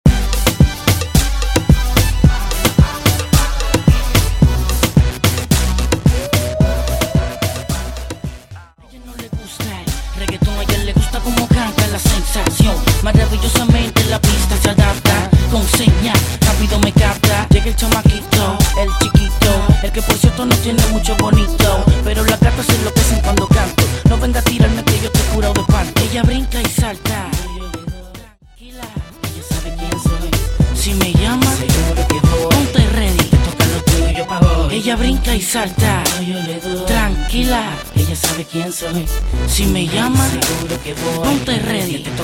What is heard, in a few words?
Intro Outro 110 BPM